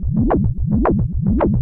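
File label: Ambience Sci-fi Energy Loop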